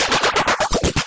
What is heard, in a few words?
noisesweep; Weird and Interesting Hits; vibra9k